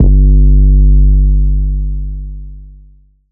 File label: 808 Bass Sticky G3; Slate 808 Deep Dive; SD Signature 808s